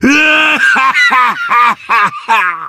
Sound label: laugh; Kinzo